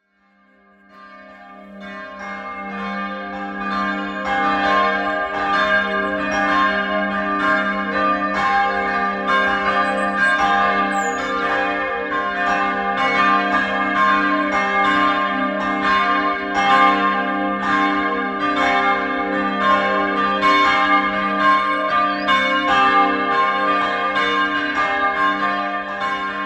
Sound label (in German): Geläut; Fliedner; Kirchen, Gemeindehäuser, Geschichte